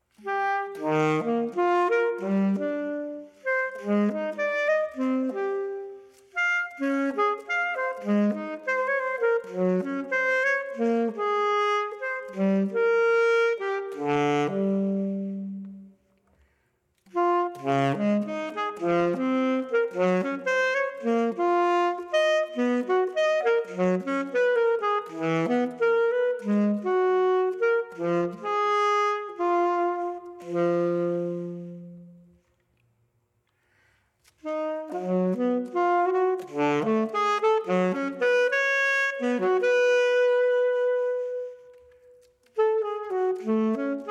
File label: Reed; CLEAN